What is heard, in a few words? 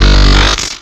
Laser Fire